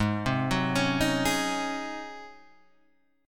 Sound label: Ab 11th Ab Guitar Drop D; arpeggiated; JGuitar